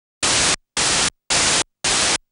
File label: noise 1dB two step; dBsteps 1dB